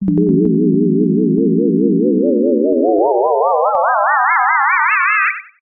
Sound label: synth theremin robot wobble two tone power up long